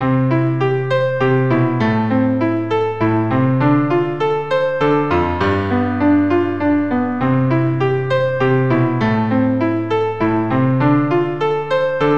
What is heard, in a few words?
C Am F G